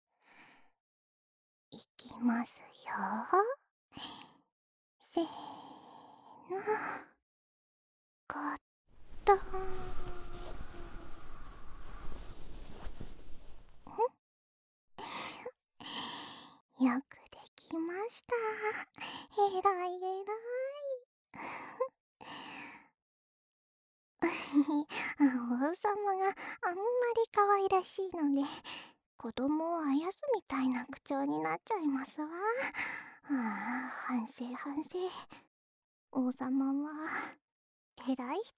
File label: ASMR